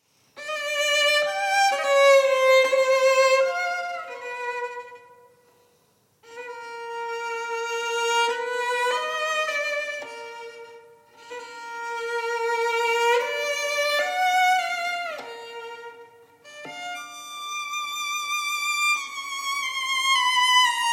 theme with 8 variations for solo violin variation v adagio